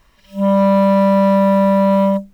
clarinet

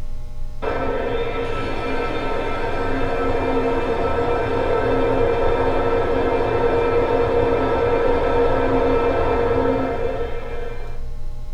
damped

